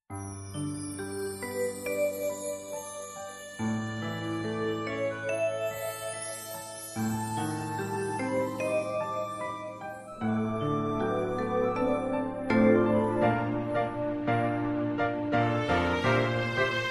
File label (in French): instrumentale